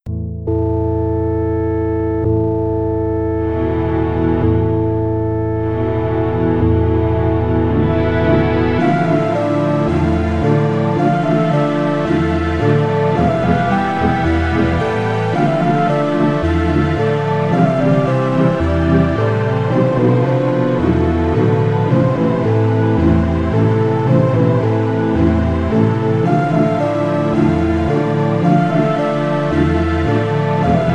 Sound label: sadness